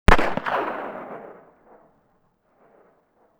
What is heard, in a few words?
park occasional